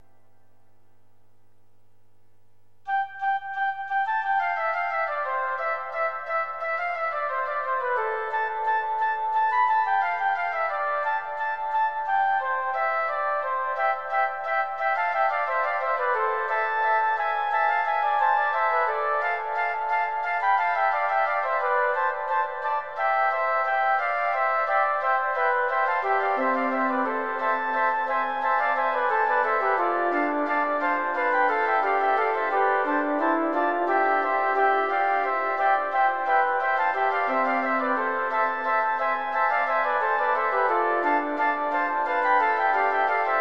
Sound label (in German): kanon fuer 3 floeten partitur